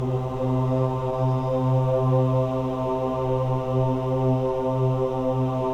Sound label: CHO AH, Choir of Ahs; Roland - LA Composer vol. 1